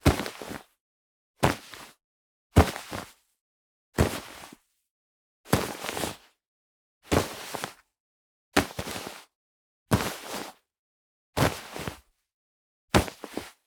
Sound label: Cloth Coat PickUp Stereo; Essentials Series NOX SOUND; Sample A Sound Effect